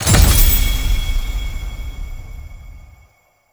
Endless UI Rewards; Duviri

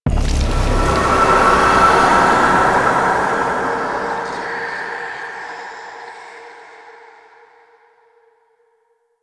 Hex Appear; Warframe; NineteenNinetyNine; Stage11FuturePast

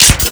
Microwave Pistol Mech; Warframe; Grineer

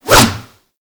Staff Swing; Warframe

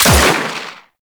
Revolver Pistol Shot

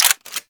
Hand Shotgun Mech; Tenno

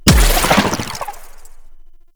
Infested Arm Cannon Secondary Shot; Weapons

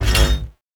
Bash Cast; Warframe; Hoplite

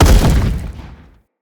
Blunt Heavy Impact Wood; Weapon Impacts; Player; Environment

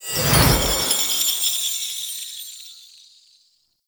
Offering Generating; Warframe; Cetus; Cetus Invasion

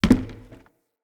Wood Stool Impact Light

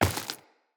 Step Flesh, Footsteps Walk; Characters; Tenno

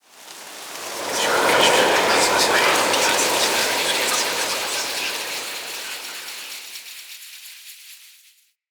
Ancestor Tree Wind; Fey Hub

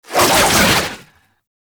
Grapple Travel; Boss; Enemies; Abilities; Warframe; Oraxia

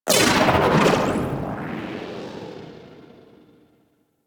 Observer Overload; Warframe; Grineer Ocean; Ocean Sabotage DNA

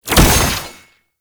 Fist Claw Spike Despawn; Weapons; Warframe; Tenno; Incarnon

